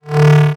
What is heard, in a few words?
Multimedia Internet CD-Rom Flash Pop Up Electronic